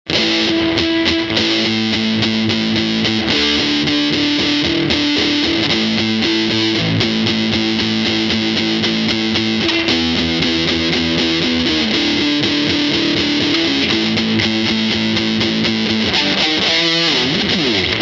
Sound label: power code